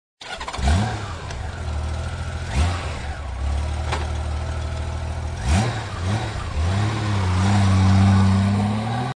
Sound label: goldwing start